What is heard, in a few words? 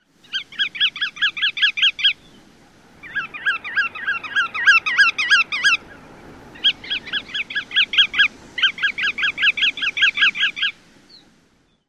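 Bar-tailed Godwit calls and song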